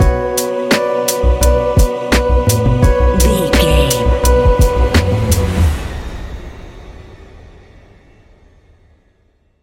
spectral serenity stinger, A relaxed and chilling piece of music